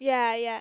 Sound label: rave yah yeah